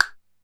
Perc, Alesis DM5

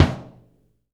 BD, JAZZDRUM; Ueberschall; Drum & Bass Flash Trackz

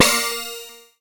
BELL-GONG, A#; Big Fish Audio

